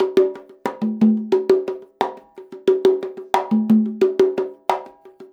CONGA, Percussion Loops; USB Soundscan vol.36; AKAI; 90CONGAS

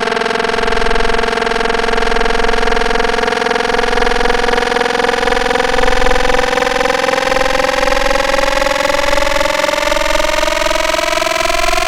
Feedback, Wavetables; Antidote Advent

